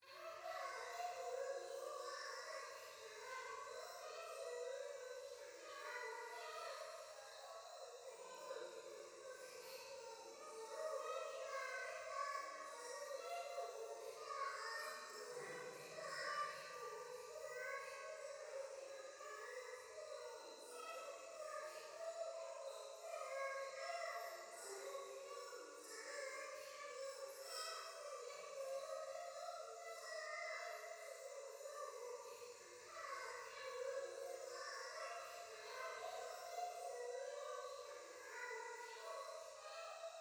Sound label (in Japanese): 可愛い喘ぎ 山奥夜; 夢の中